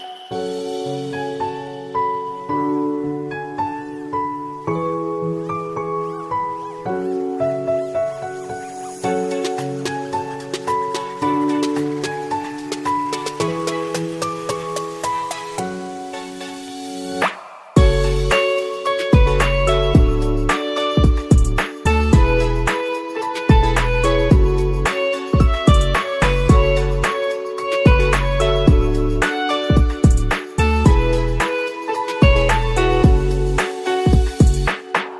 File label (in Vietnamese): Beat Lofi